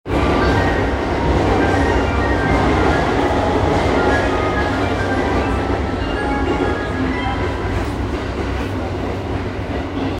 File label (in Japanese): JR 山手線 御徒町 CW, train sounds